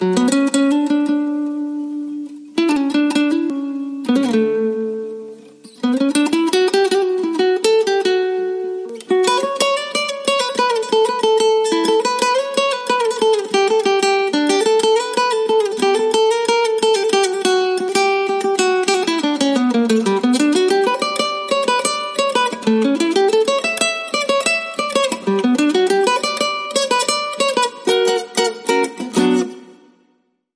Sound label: Wine Kisses, Guitar Only